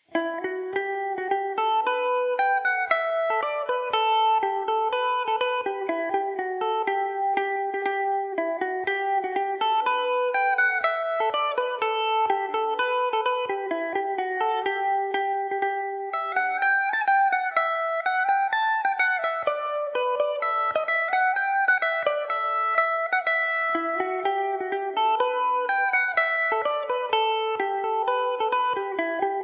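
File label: foggy dew G major revelation, mandolin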